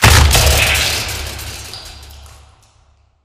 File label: vent fan death; beacon; Titanfall 2